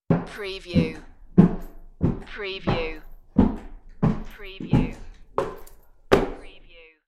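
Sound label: Footsteps Wooden Stairs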